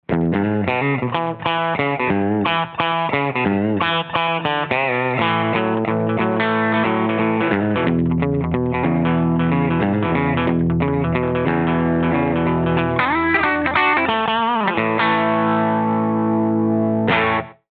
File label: GT-10 Country Rythm